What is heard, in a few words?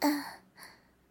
light breathing; ROBLOX GAME ASSETS